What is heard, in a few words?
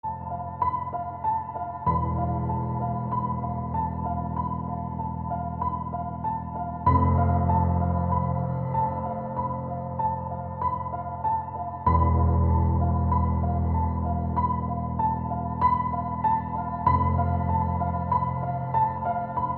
iphone x house ringtone